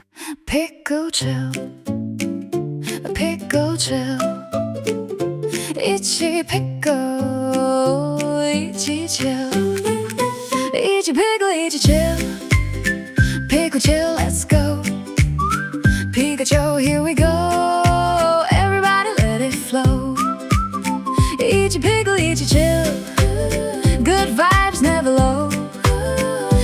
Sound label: jazz chill